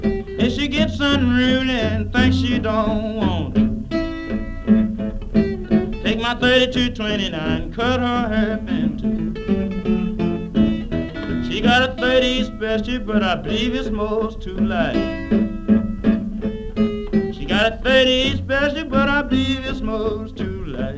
blues

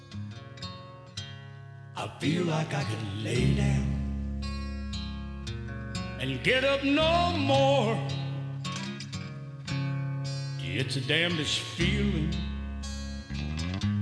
country

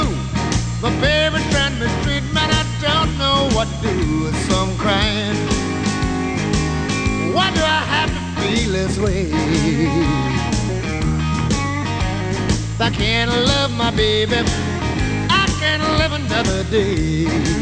blues

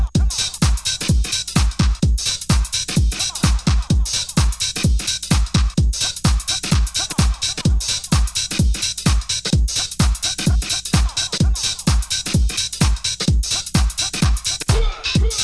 reggae

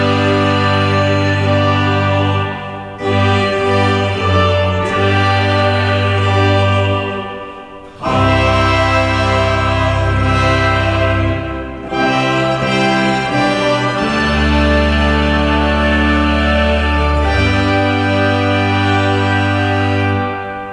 classical

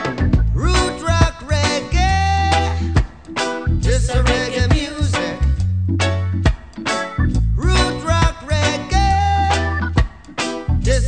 reggae

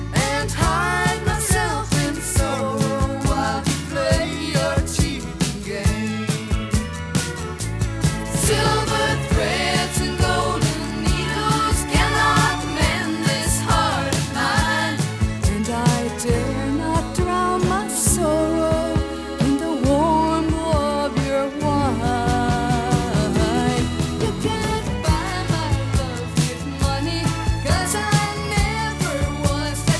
country

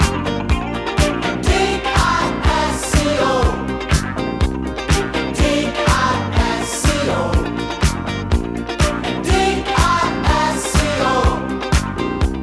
disco

